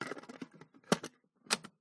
household stereo hifi tape cassette deck insert close